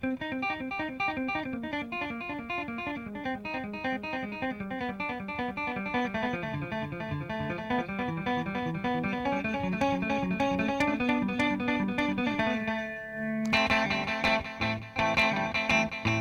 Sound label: Clean Syncopated Delay, digital delay; electro-harmonix 1-echo